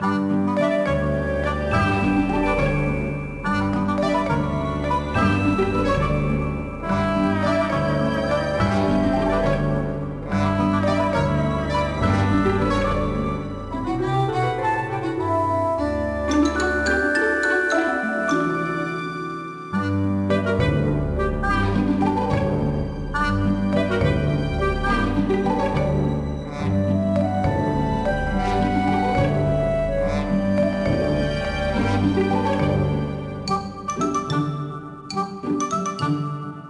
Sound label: game music; Cut the Rope